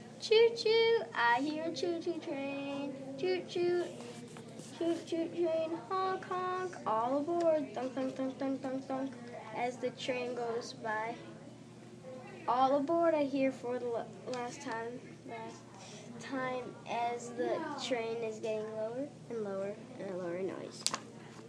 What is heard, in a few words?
choo choo train